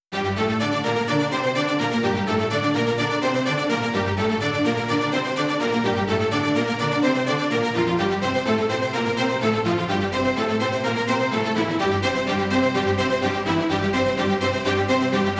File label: tension violin strings; By Burak Sound Bank